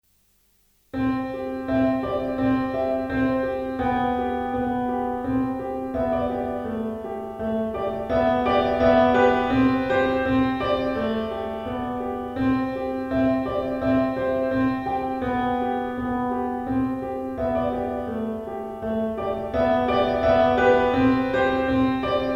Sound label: piano pop